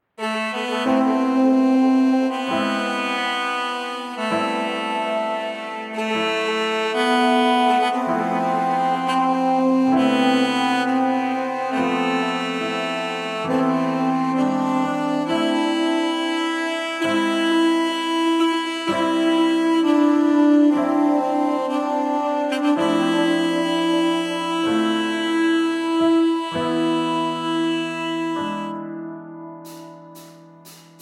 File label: snake charmer